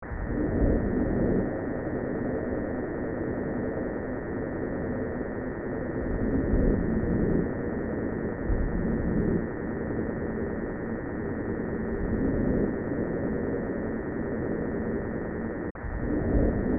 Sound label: Unknown Ambience